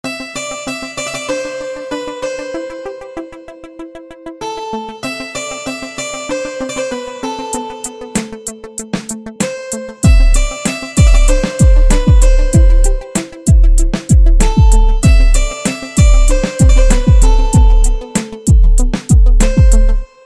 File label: Bass And Guitar Mix